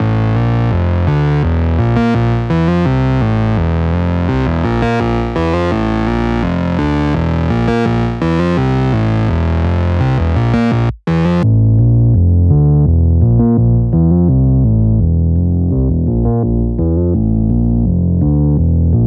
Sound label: Sub 37 Moog 84 F-Dm